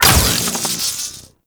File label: Micro Zap Fire; Warframe; Corpus; Arachnoid Micro; Abilities